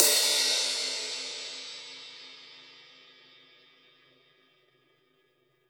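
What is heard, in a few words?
crash, Drums Acoustic; USB Soundscan vol.10; AKAI; RAW KIT 8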